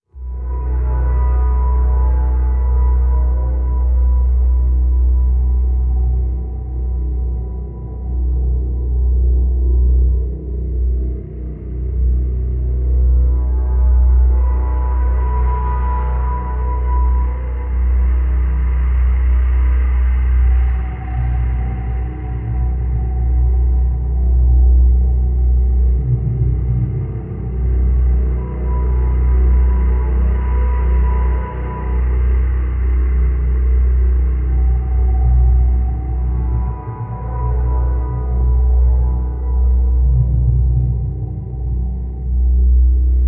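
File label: Drone B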